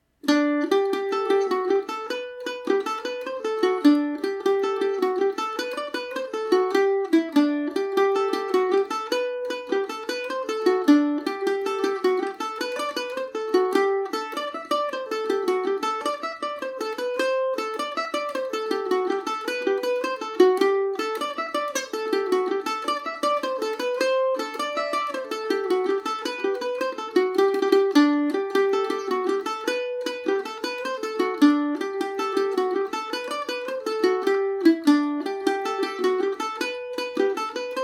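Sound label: G major paris swing, irish mandolin; Paris Swing Macaferri mandolin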